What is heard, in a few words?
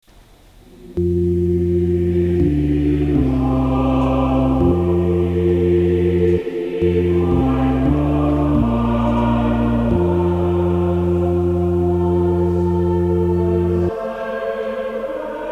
Bass accomp